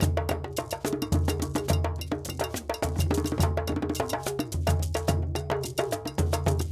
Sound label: Djoun, World Percussion; Djembe, Donno, Bell, Shaker